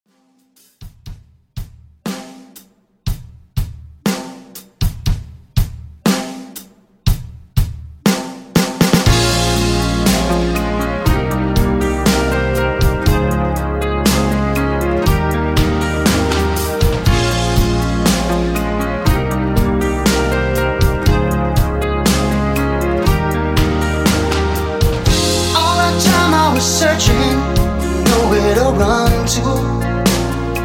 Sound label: 80s Pop Rock Re Drum 120 Bpm